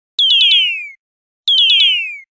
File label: Nokia Alerts; Windows Phone apps